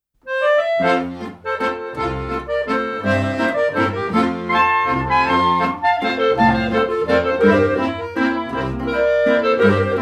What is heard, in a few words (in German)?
Unterlandler Halbwalzer; Balladen Moritaten